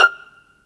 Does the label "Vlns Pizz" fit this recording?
Yes